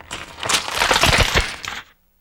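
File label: bone crack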